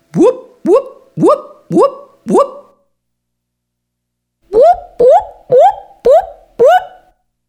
Male Female

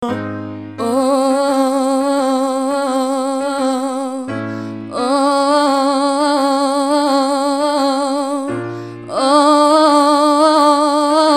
Exercise Note Flicker

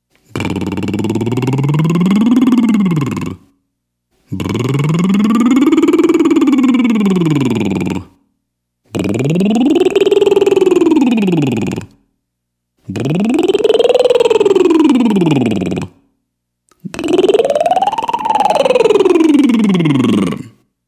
Lip Rolls Male

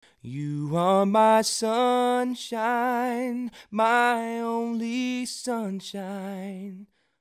pull sunshine

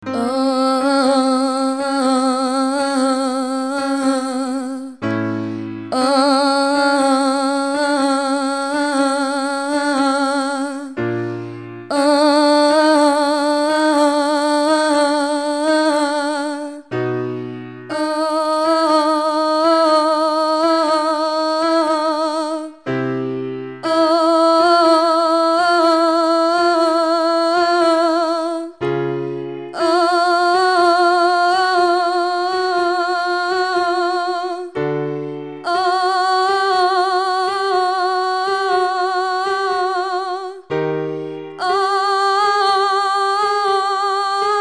Note Bend

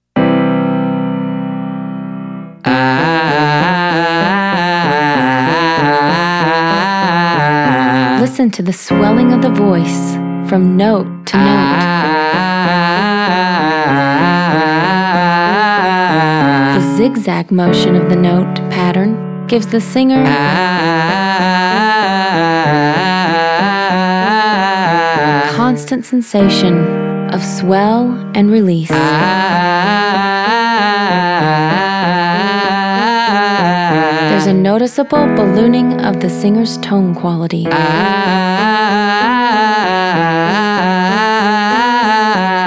Exercise Ah as in Hat males

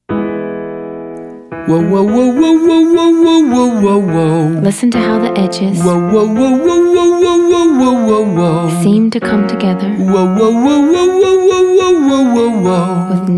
Exercise wohw male

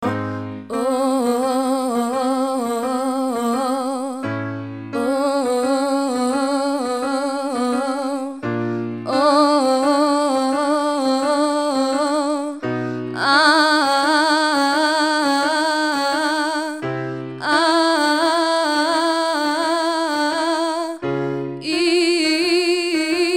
Exercise Note Bend Going Down

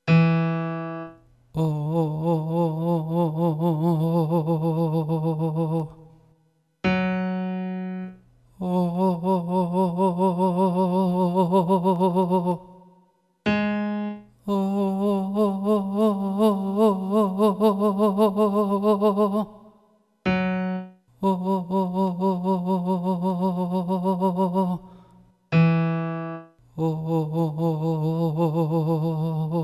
Belly Vibrato; with demo; Singing

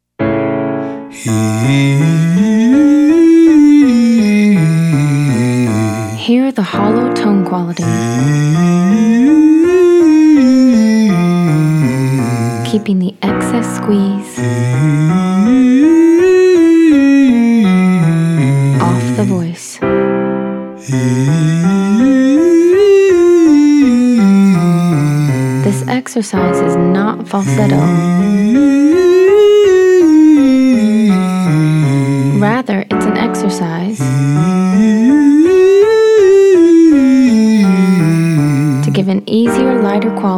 Exercise hooty eee male

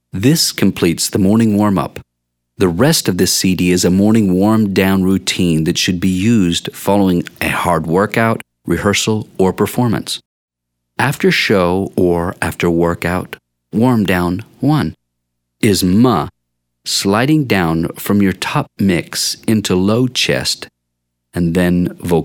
warmdown Vocal Fry males

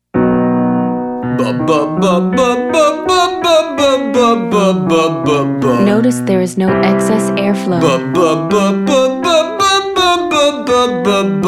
Exercise 4 males

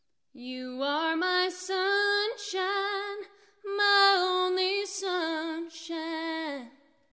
female vowel modification in Country

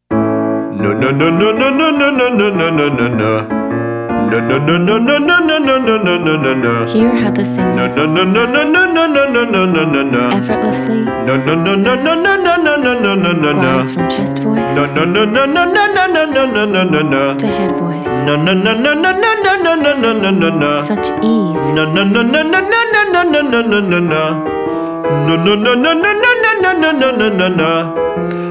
Exercise newa males

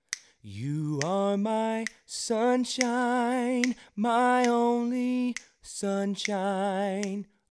push sunshine

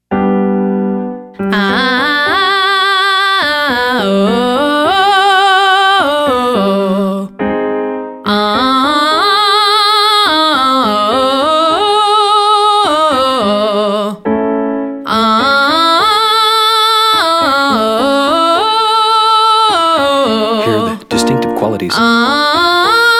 Exercise 5 Ah Uh female

